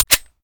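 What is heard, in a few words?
pistol reload empty fast chamber; reloads; gmod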